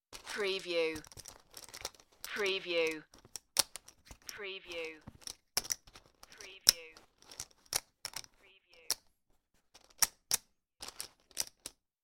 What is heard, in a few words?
Poker Chips Collecting from Table